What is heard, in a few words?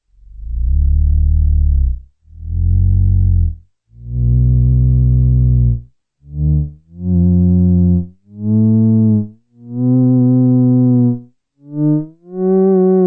theremin